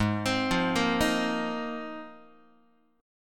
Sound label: Ab 6th Add 9th Ab, Guitar; arpeggiated; JGuitar